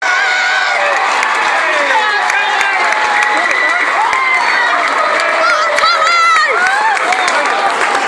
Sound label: cheers from supporters after winning minor final; towers